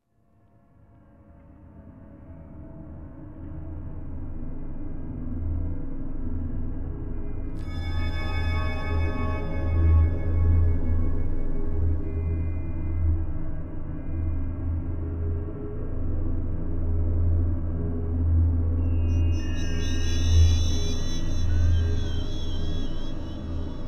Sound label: Amb Outdoor; The Hobbit; PS2